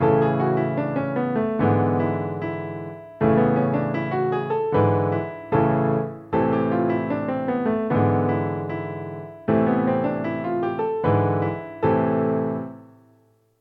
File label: 16th note in A and Am